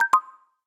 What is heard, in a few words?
Down; amazon fire hd 8